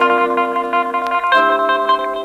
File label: Maximum Gtr